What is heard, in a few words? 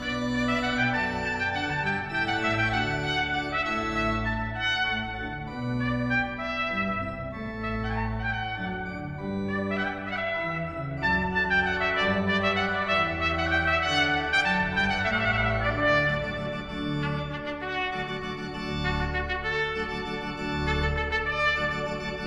Sonata In D Major, trumpet and organ; music for trumpet and organ